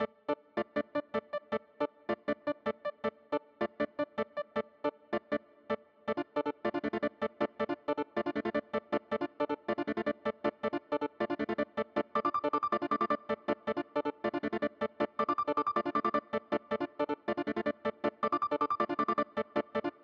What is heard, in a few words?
firebattle